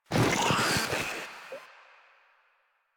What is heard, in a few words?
Infested Ancient Attack; Enemies; Proto Infested Ancient; Warframe; Ancient Ninety Nine